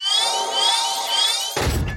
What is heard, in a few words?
deform; Thunder Fighter